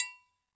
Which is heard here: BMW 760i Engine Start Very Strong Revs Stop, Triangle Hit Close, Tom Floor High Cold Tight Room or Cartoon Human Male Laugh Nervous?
Triangle Hit Close